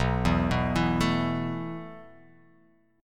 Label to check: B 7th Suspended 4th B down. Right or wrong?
Right